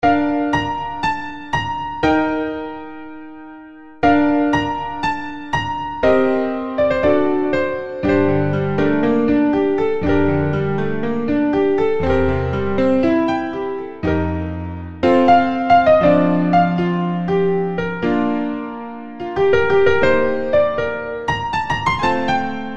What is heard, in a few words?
piano